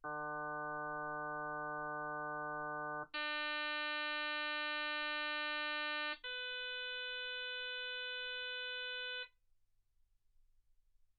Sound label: harm sinus 48kHz